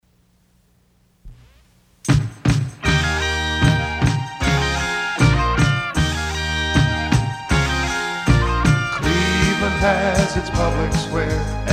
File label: JINGLE; PLAYHOUSE SQUARE